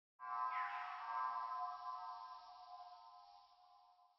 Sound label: ambience room necromastery magic layer